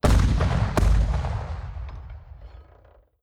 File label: artillery unit fire; Hearts of Iron IV Death or Dishonor